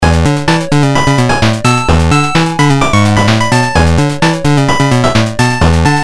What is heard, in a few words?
GameBoy; Kingyo Chuuihou